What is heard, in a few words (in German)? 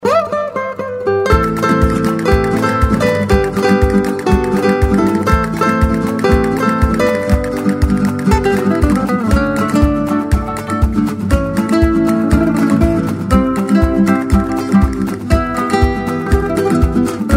Andalucia Musikbeispiel